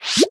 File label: pufferfish blow up; minecraft